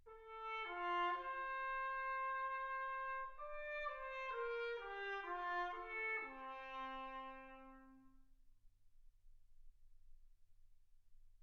trumpet

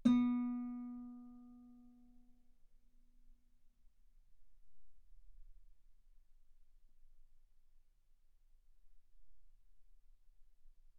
Guitar ff B3

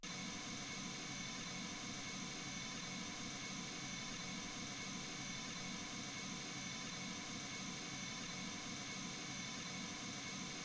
mls 48kHz

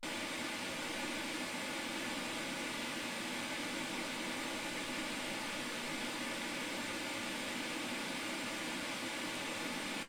wgn 48kHz

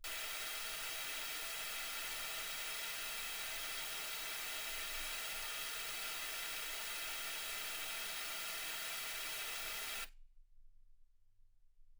wgn

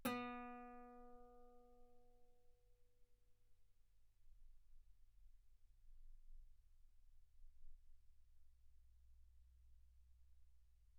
Guitar B3; ff; sul B

